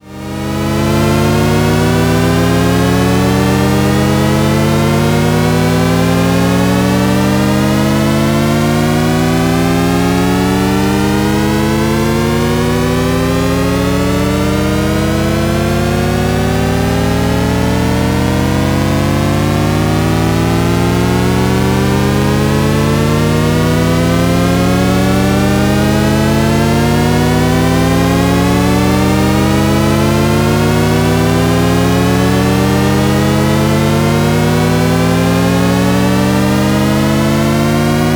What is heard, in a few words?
Shepard mid Pulse; experimental